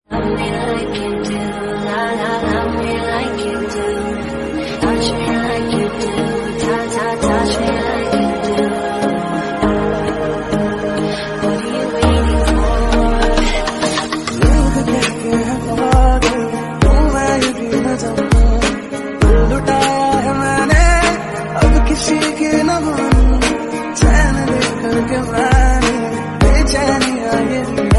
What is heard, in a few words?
love lofi song mashup remix